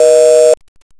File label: busy; Asus P525